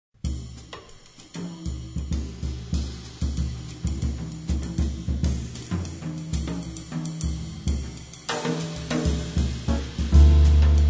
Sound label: Trio